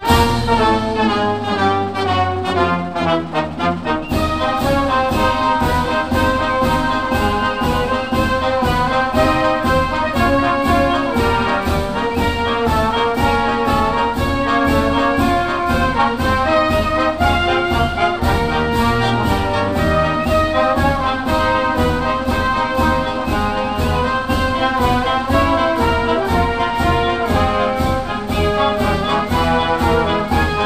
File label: victory Music; bombsquad; game media